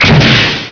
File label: MISSILE